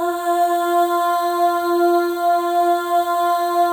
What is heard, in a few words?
AH, FEM; Spectrasonics; FEM.2 AHS